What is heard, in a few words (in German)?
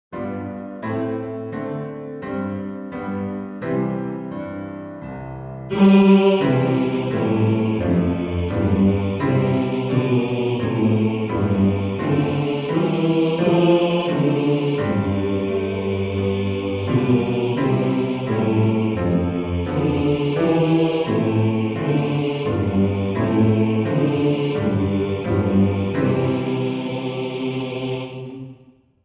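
Bass 2, Männerchor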